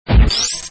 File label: Ice Spazer